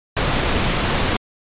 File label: Pink Noise T60; dynaudio compound5; DerRaum; Lautsprecher